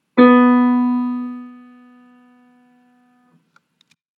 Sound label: soprano chromatic note